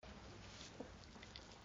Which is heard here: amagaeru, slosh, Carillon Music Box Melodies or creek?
slosh